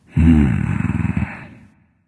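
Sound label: nonverbal content; smite; voicepack; ullr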